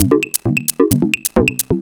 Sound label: 808 Loop Perc 132, Roland 808 Drum Loops, 132bpm